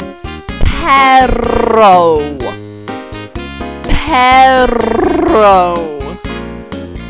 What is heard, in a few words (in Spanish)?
perro